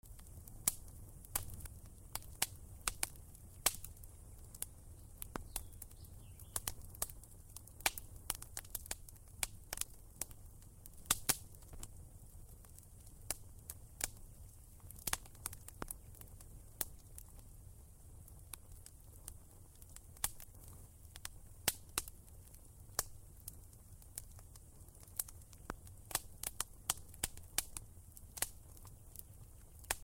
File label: fire crackling campfire